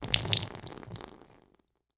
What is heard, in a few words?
water spill carpet floor